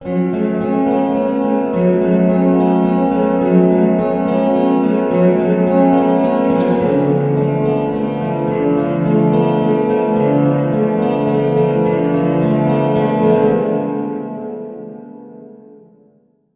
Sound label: IR reverb cathedral; Digital Audio FX